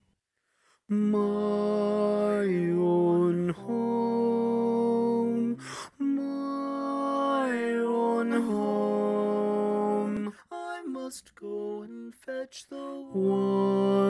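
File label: baritone, barbershop tags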